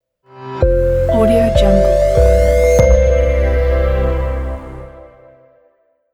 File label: full 360 ident